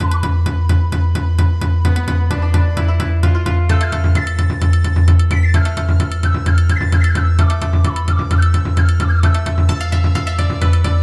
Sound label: Battle Victory; Naruto Shippuden: Ultimate Ninja 1